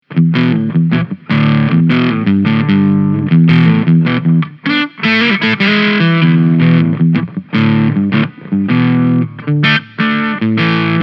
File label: Fuzzy; Boost CRUSH